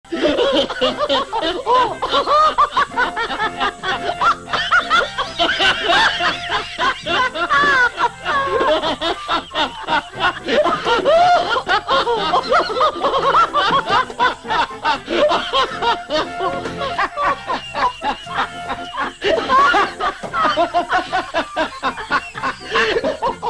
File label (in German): lachen; 31 sek; klingelton